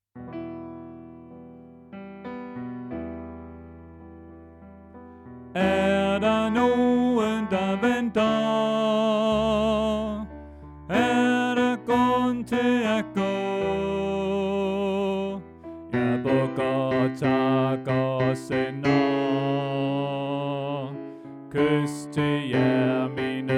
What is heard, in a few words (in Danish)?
Bas